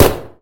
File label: vector fire; weapons